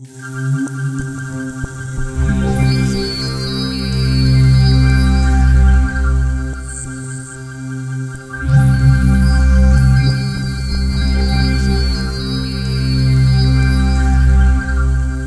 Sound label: SynthLine C2; 90 s Sample CDs; NiceBeats; SynthLine VOL-15